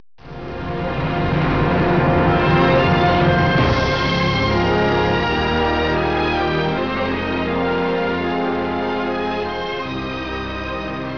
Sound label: overture music; mononoke